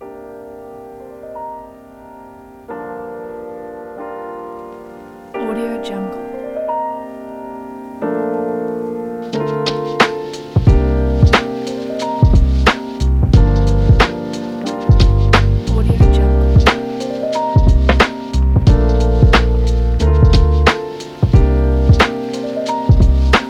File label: hiphop lofi